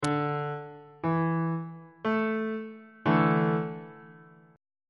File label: d suspended 2nd chord on bass clef